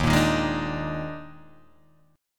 D sharp Major 7th Suspended 4th, D sharp, down, Guitar, Open G